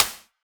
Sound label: Clap, Psytrance